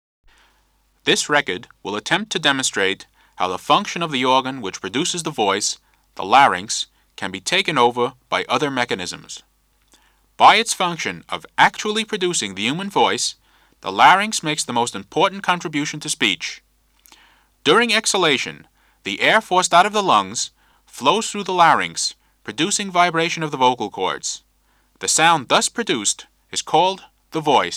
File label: Coloratura soprano singer; Speech after the Removal of the Larynx (1964); Mado Robin